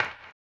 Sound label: HAMBONE, Vocal Blues Soul